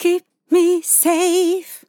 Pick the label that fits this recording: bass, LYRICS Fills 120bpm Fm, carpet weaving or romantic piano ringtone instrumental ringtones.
LYRICS Fills 120bpm Fm